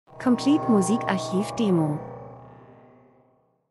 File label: transition; Transition & Movement - dunkel düster tief Angst